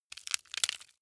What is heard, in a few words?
wood sticks small crack